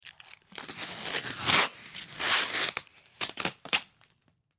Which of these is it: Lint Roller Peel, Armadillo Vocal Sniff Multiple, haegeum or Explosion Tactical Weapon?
Lint Roller Peel